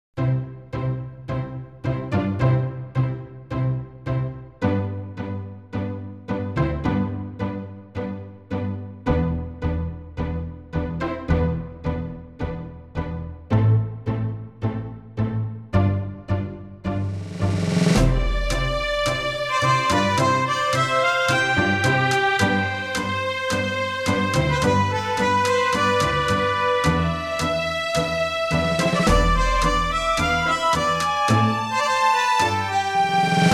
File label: Canasta tango